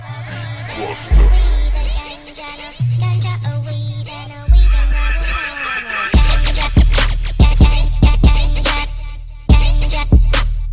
black beat, hip hop

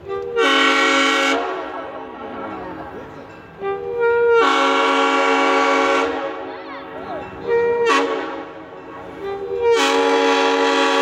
Train; Southern Rail

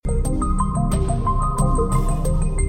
Suspence

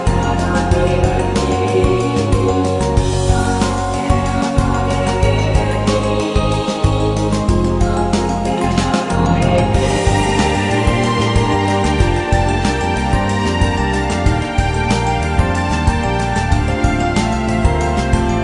Suspense; Maria Holic

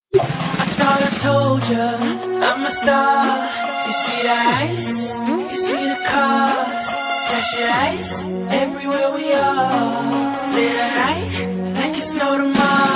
Is Calling Rap, ringtones

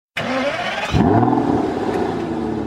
engine start

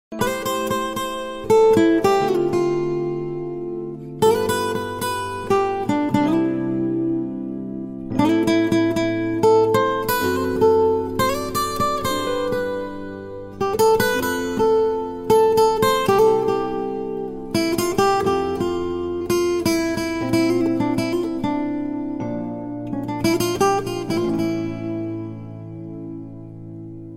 guitar instrumental; ringtones; latino

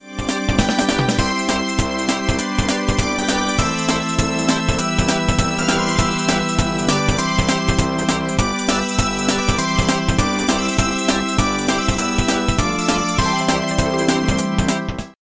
Football SMS Tone

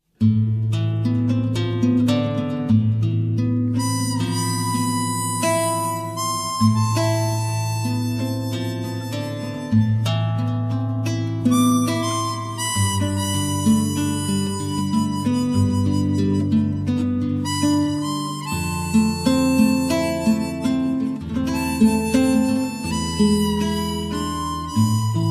sad love story

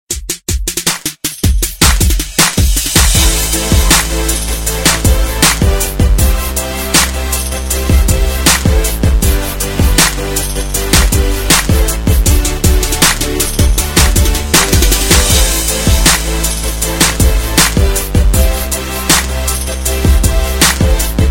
hip hop beat

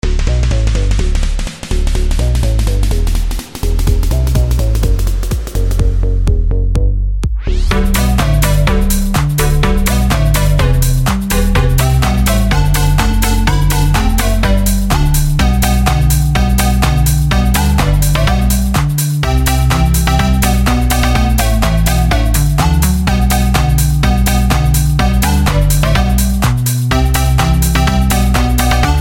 jingle bells house

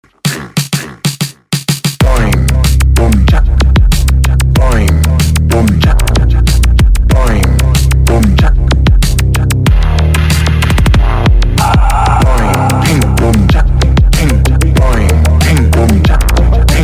Boing Boom Tschak

